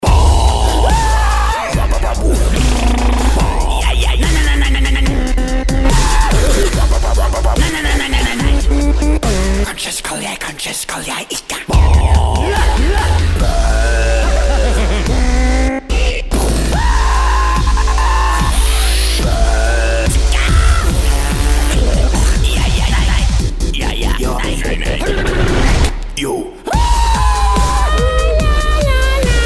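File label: dubstep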